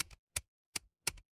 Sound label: ui typing